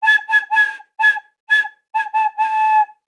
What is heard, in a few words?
playing a whistle toy